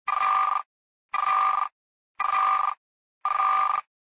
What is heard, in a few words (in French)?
sonnerie occupe